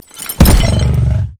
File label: emote dance; LoL; sejuani base